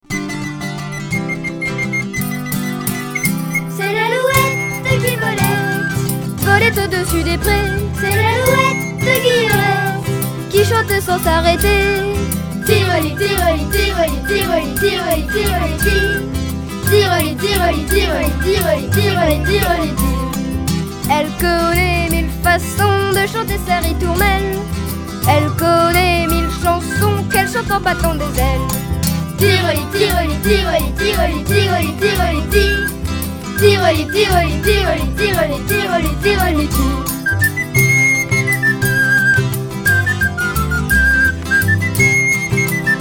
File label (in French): Chanson; Un jour à la montagne